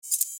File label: subtle tech interface